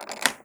CASE OPEN; AKAI Anime Sound FX

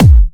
Trance Kicks, Bassdrums

